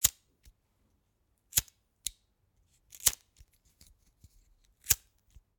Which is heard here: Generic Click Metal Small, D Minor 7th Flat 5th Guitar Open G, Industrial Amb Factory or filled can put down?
Generic Click Metal Small